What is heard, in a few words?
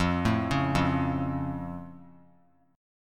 F Augmented F; arpeggiated